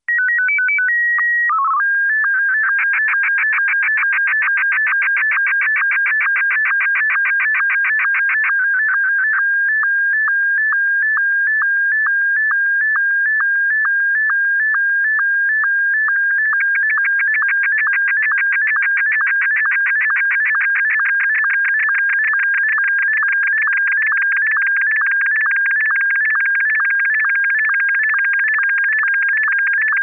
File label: SSTV MARTIN1; ham